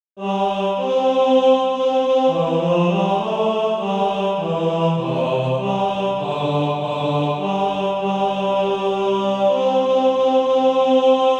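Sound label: tenor, Practise Music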